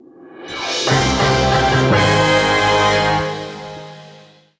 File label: 3rd Place Goal Fanfare; crash bandicoot series sound box; Track and the Beanstalk